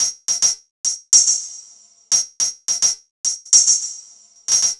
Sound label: Hats Beat, Electronic Beats; Sonic Foundry (Sony Creative Software); Myths of Technology